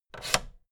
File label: fader plastic scrape double up fast